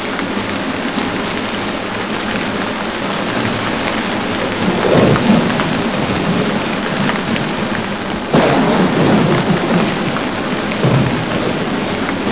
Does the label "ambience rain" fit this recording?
Yes